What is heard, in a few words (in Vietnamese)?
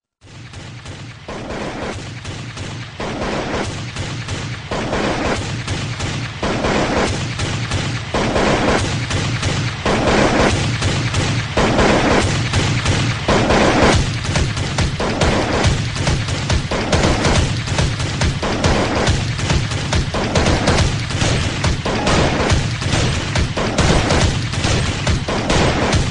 Nhac chuong tieng sung remix